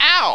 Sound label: golf